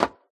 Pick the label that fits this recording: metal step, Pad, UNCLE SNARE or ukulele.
metal step